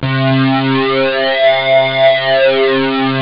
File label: sweep up; jazz jackrabbit 1; M8